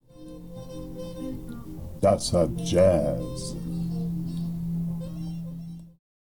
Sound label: jazz